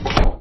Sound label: Door Lock